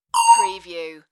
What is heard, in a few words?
Zinger